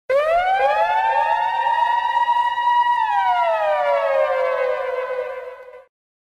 siren dj sound effect

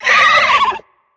pig; angry birds; mynock

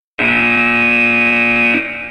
red buzzer; got talent

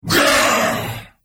demonic hit

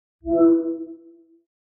facetime answer

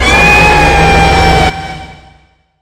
screamer

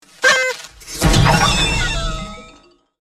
pig cannon fire

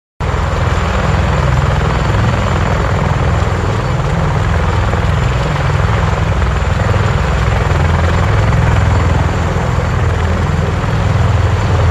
helikopter sound

